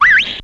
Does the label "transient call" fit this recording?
No